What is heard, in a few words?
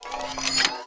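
attack harp bow cast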